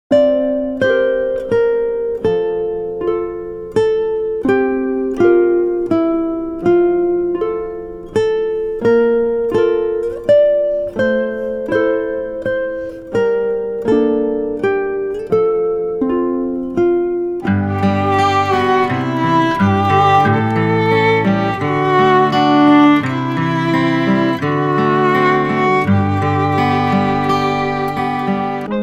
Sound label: acoustic lullabies